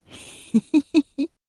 tux laugh; ktuberling; games

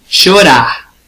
cry; gcompris-data

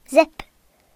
zipper; gcompris-data

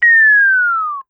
end beep; kairo

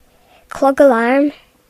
alarm clock; gcompris-data

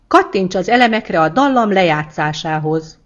melody; gcompris-data

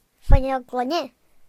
window glass; gcompris-data

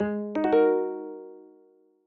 Sys Question; Oxygen; plasma

